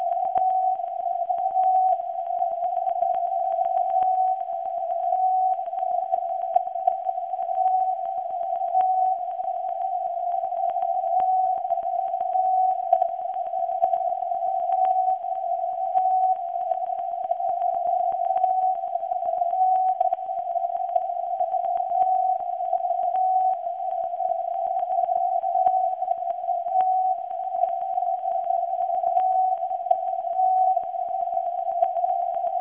11.9kHz; alphas; websdr

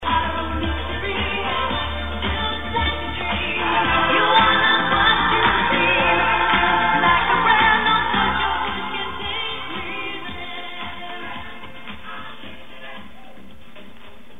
Tube Amp Test; Röhrenverstärker

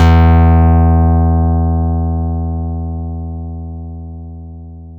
814Hz E3 Distortion

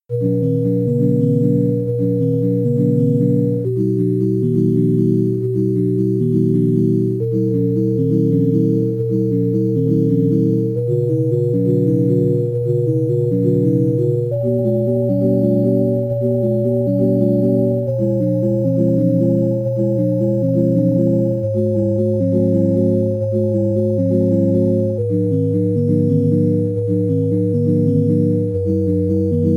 bach simulated playtunes

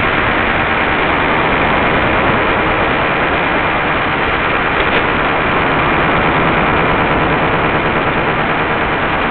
5850.0kHz; websdr

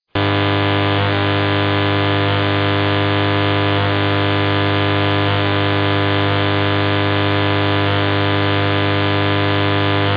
mw 801 khz

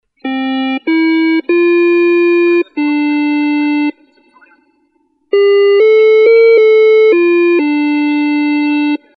testsignal

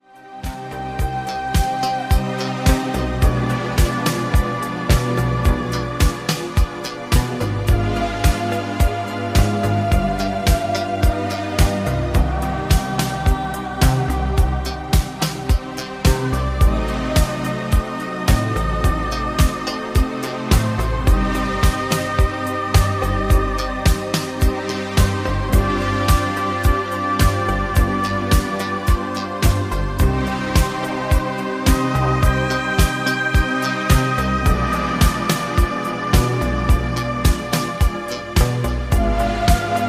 music

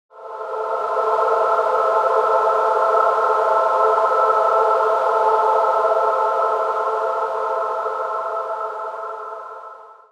noise generator choir pad

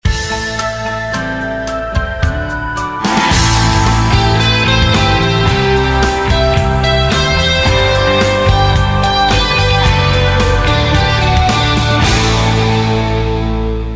8 bit

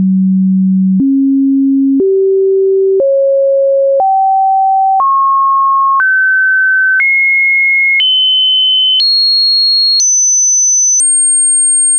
cochlea frequencies

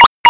car sound square

